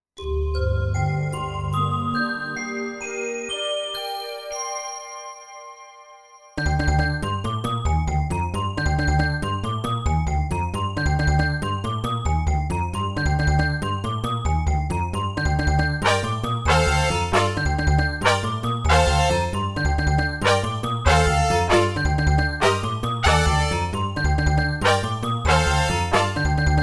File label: Emax factory runaround